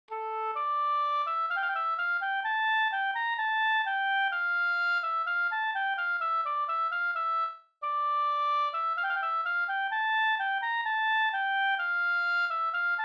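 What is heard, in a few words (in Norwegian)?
brudedans fr Aurskog, Norway; Mats Berglund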